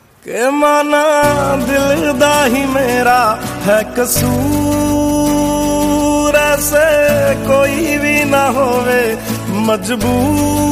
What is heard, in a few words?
Punjabi Sad Song Ringtone